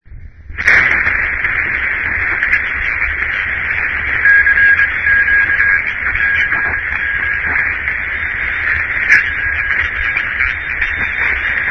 radio cw; west haven

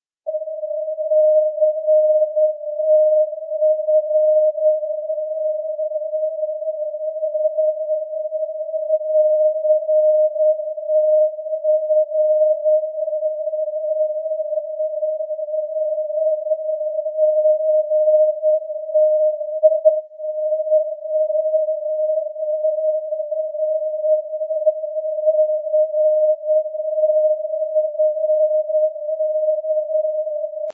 ndb catania-fontanarossa ctf; makadi bay

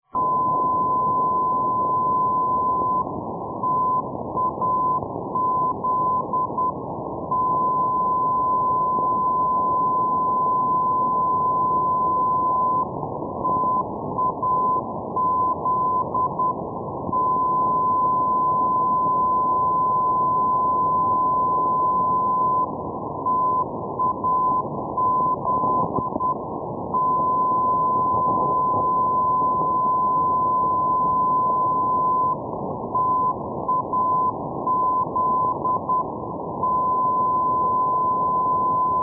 ndb tivat

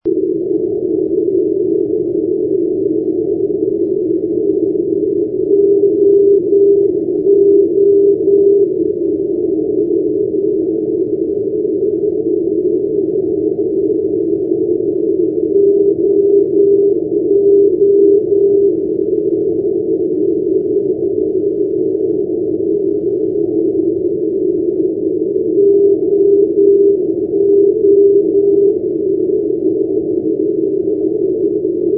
ndb oostende; oo-375; europe

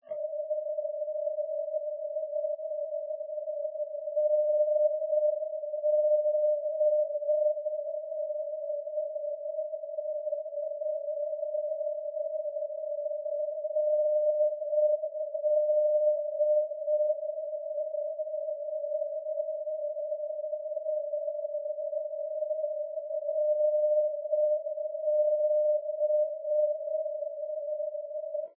ndb bolshevik

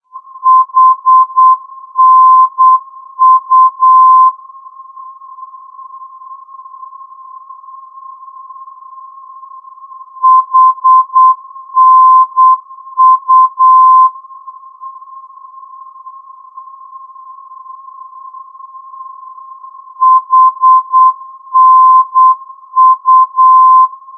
ndb hanau hnu-432; europe

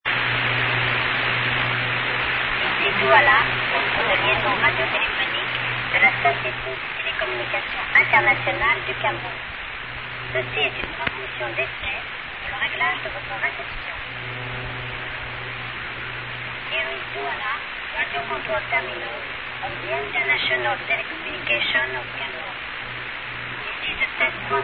usb, utility radio stations

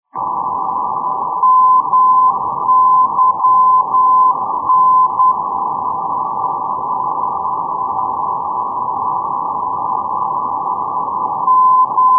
ndb muenster; myn-371; europe

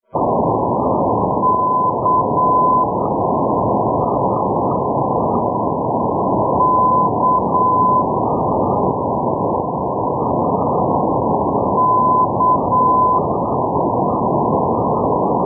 ndb praha kbely kalda; europe

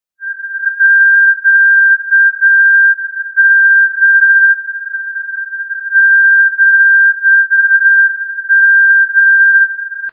cw id; ndb; europe

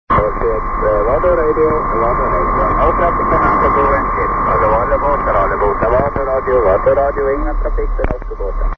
vardo radio

